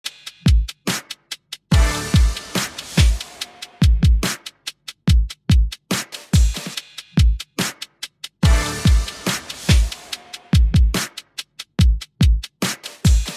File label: Musical Beats; smirksmilegrin games